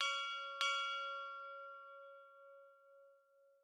alarms; HTC; One-M7

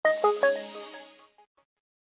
Power Off, System; L20 D105f

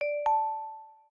Charging Started Calm, Samsung Galaxy S21

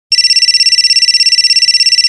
Ring Standard

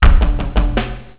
Drums, Cisco Unified IP Phone 7945G

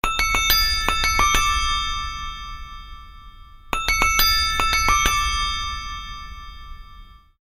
Crystal, alarms; Meizu MX4

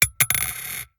Coin, notifications; Newman CM810

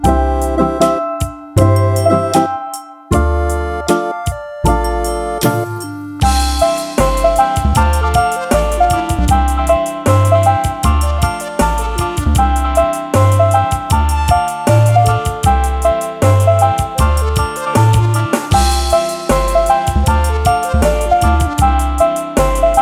Ringtone Pop; i-mobile i-style 3; ringtones

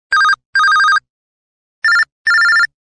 Simple Beep; LG9250 Ellipse

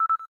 cam timer last

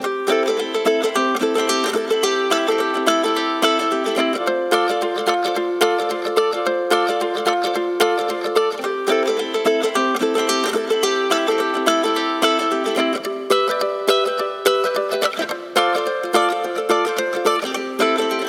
ringtone ukulele; Mudita Pure

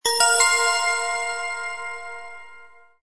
message, notifications; Gionee; Pioneer-P1

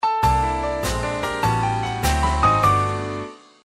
Message Piano; LG T300 Cookie Lite

